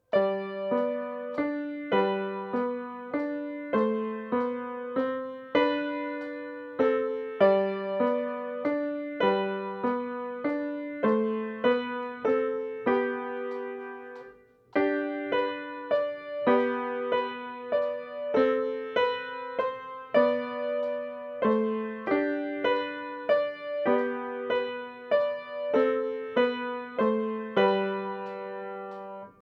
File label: old mill wheel